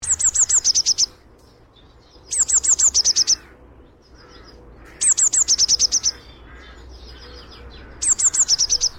blue tit

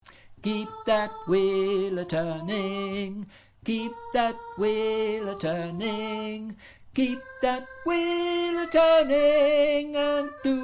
Chorus Voice Sop

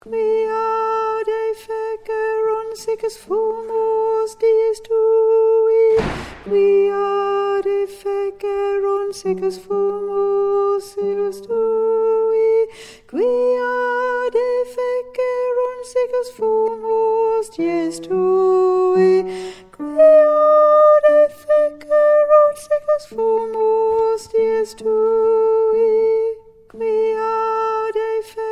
we will remember Tenor

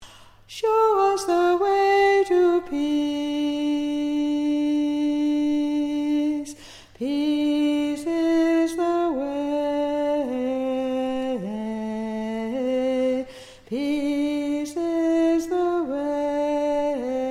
Bass

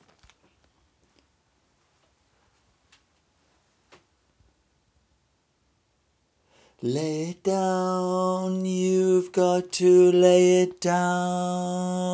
Tenor; Lay it Down Tom

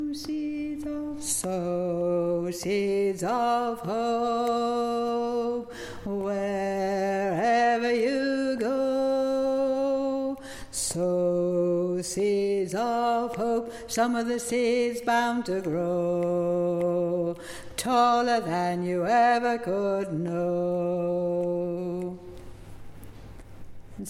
Lower

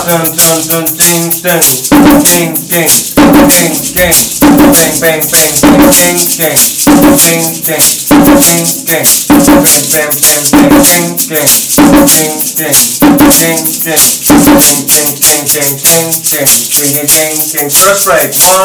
samba snare

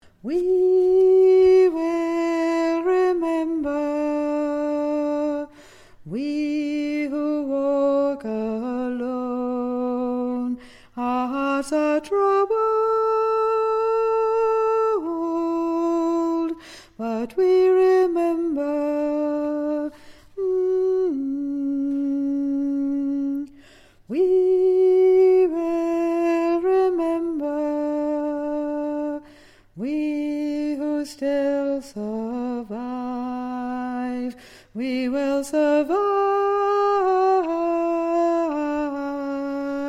we will remember Sop

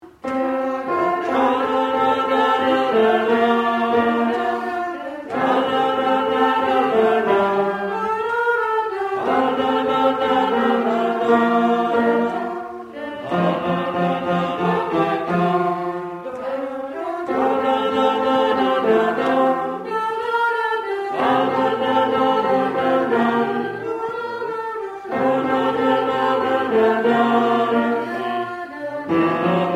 tenor Tune

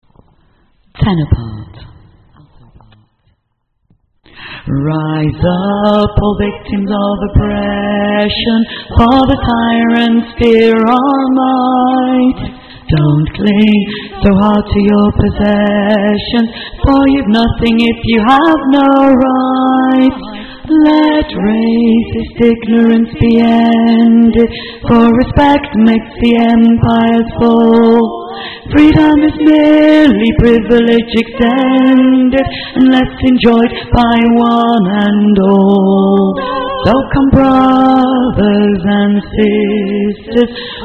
Tenor; manchester

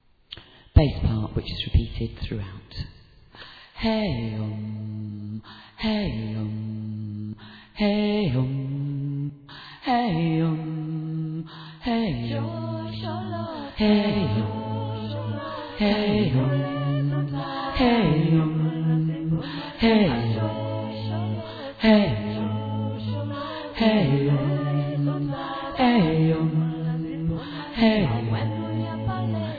Bass; manchester

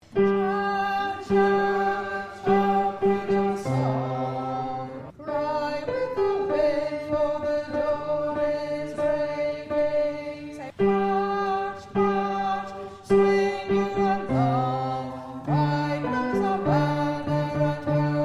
alto

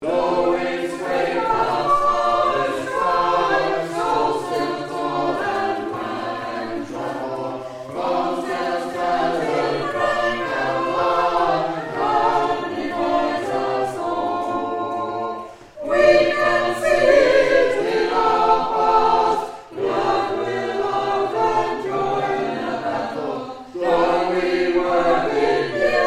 bringing in the sheaves All

